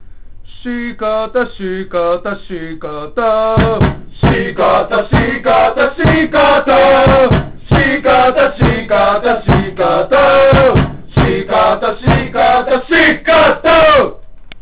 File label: song